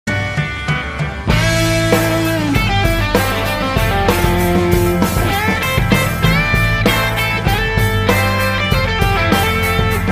Steinway

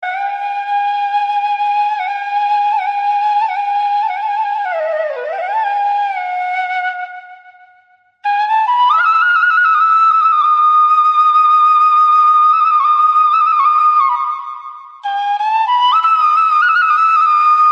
Achutam Keshavam Flute

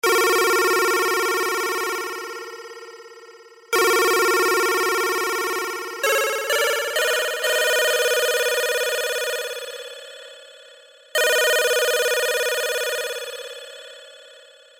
ring ring ring ring

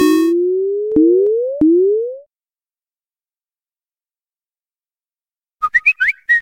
whistle; whatsapp

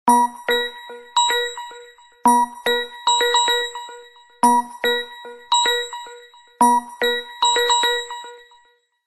Ringtone Samsung A51

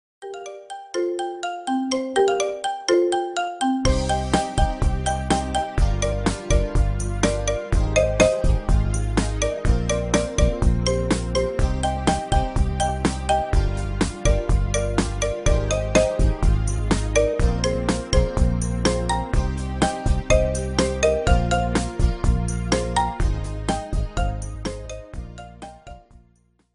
marimba

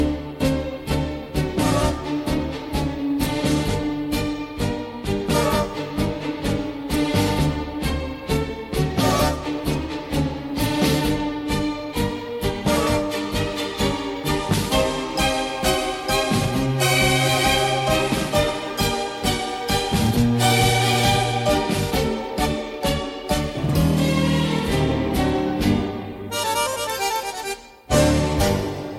Tango

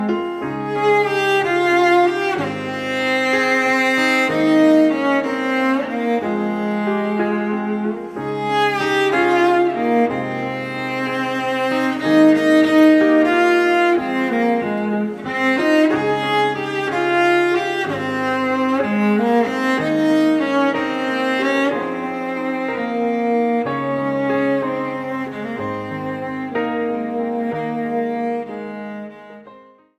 Piano Cello Cover

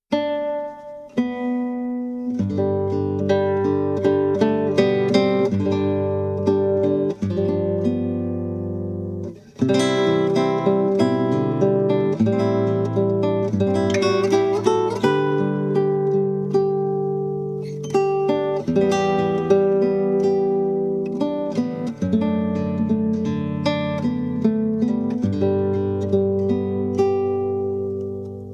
Dixieland

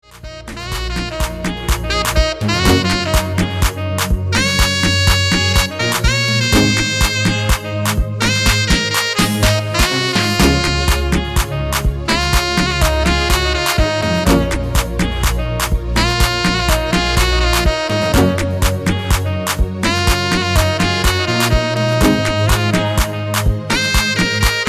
Saxophone

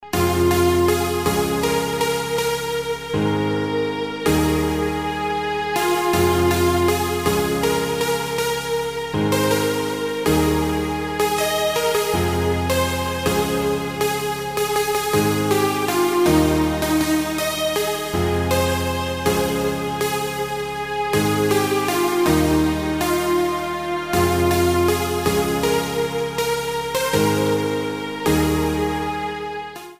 Hello Instrumental

